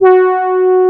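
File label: horn G4, synthesizer analog horn; AKAI S6000 CD-ROM - Volume 1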